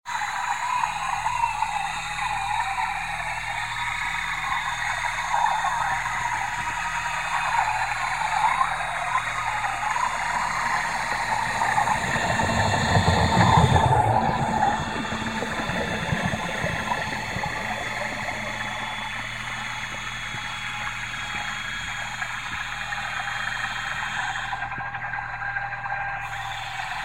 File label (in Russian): torpeda; Звуки подводной лодки